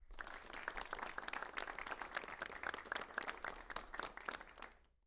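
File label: Crowd 50 Light Burst Interior; small int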